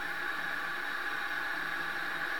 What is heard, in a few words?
Drink Machine